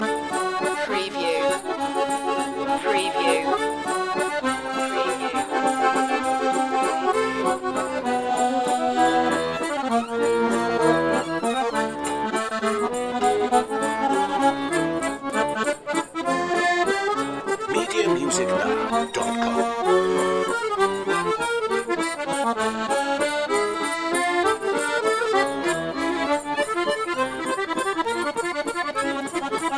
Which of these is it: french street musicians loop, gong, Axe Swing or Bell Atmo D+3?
french street musicians loop